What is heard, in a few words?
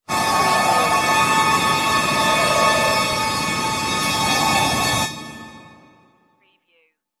magic storm blast